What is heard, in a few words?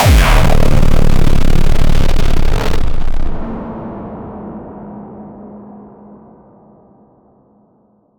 hard hitting industrial, techno